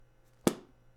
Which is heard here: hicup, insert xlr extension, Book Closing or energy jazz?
Book Closing